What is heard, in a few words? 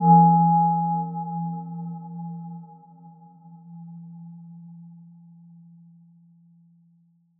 Aurora G3 f; healing soundscapes; HSS-Custom-1